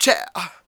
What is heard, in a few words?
FEM PERC, Vocal Jazz FX